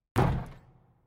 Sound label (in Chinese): 未命中; 战中; nba